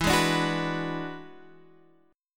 E 9th E down Guitar Open D

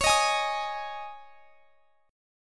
Db Suspended 2nd; strummed; x,x,23,20,21,23

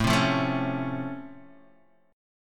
C sharp Augmented Major 7th A, chord

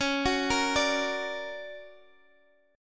D Augmented Major 7th Db, guitar

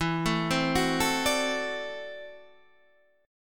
D 9th E, guitar; arpeggiated; JGuitar

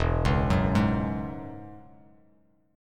E 6th Add 9th E down Bass Standard

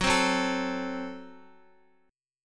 F sharp Diminished Guitar; F#dim strummed

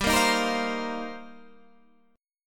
F 7th Suspended 2nd Suspended 4th

